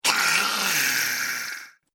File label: Scream Vampire, Monster Sound Effects; horror sound effects